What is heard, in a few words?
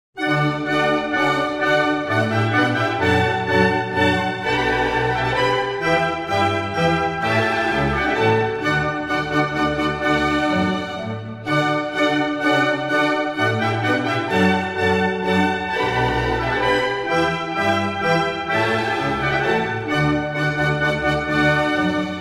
Water Music Suite No. 2 in D major - Menuet; HANDEL, Georg Frideric; The Water Music Suites